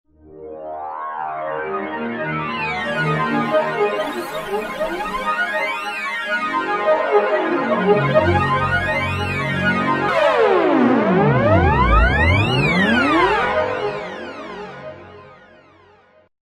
SBF Sync Pad, Roland V-Synth; Factory Patches

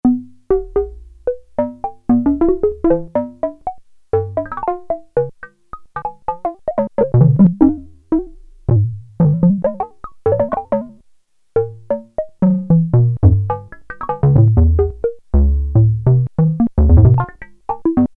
Digital Indigestion, Prophet-5; Sequential Circuits Prophet-5 (Ver.3); Factory Patch; File 2 Programs

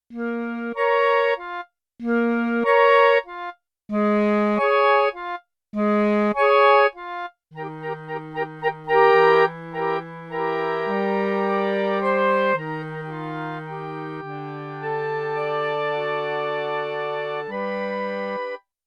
Ensemble, Bb Clarinet and Clarinet; Yamaha TX16W

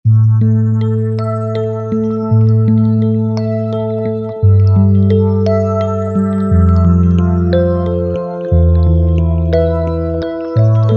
Piano Warp, Roland V-Synth; Factory Patches

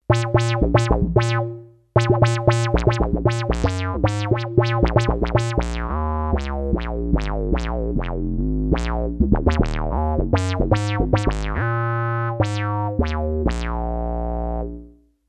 Wow Wow

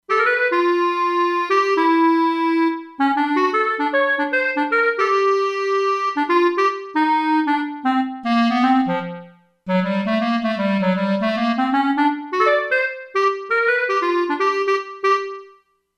Verb Clarinet, E-mu Proteus-2; Factory Presets

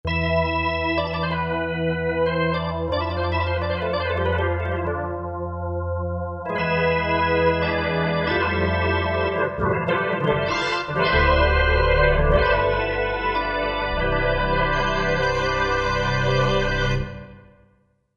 Ensemble, Korg MSC-9S Organ

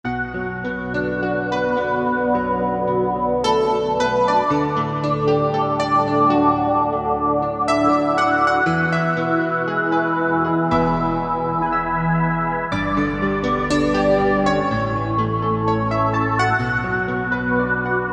Dream Piano, Korg MSC-9S Organ

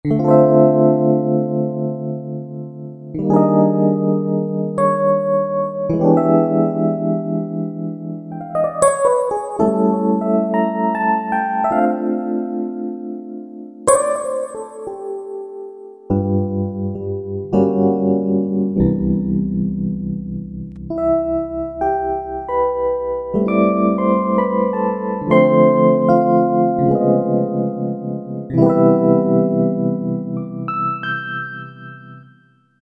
MK-80 Rhodes, Roland V-Synth; Factory Patches

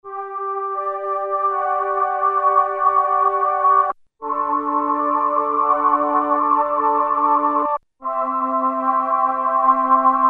Chorus (Female); Factory Presets Bank B

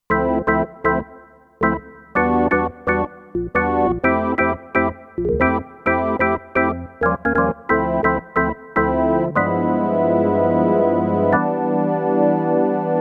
Perc Organ, Kurzweil K2500X; Factory Programs

